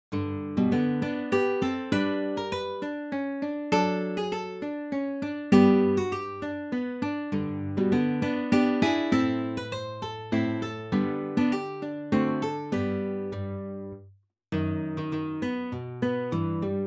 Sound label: rustic dances